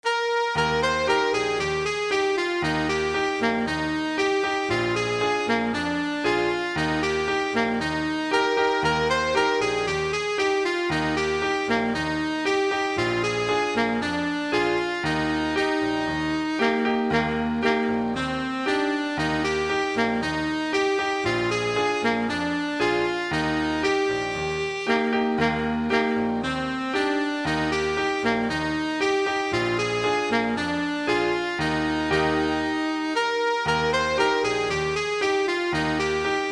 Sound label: saxophone; mango walk